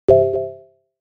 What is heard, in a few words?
notif